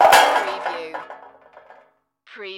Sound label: Impact Metal; Action, Impacts & Explosions